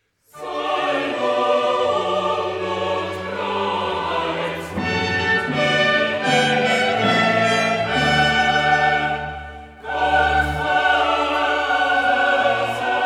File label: Bach Chorale Wir danken; Baroque